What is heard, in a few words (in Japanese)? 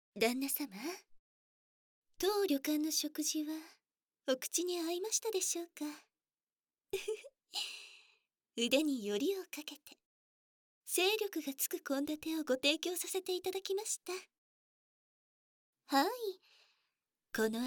耳舐め囁き手コキ（バイノーラルマイク 効果音あり）; 晩酌でお酒+媚薬口移し